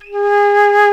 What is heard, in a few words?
flute G#2, ebony flute; InVision Interactive; Lightware VOL-1; Instruments & Percussions